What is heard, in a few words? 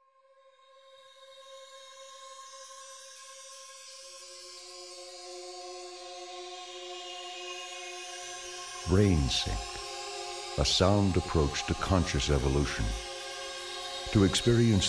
Music + Brainwaves; relaxation